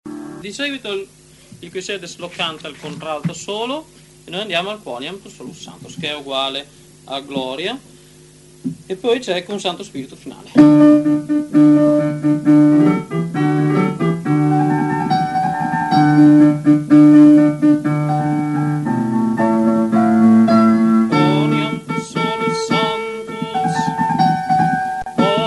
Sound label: Gloria Quoniam Tu solus Sanctus Tenori; Vivaldi